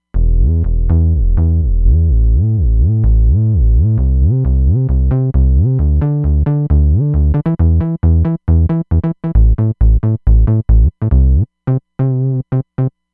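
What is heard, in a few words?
SH-101 Bass; Roland Fantom XR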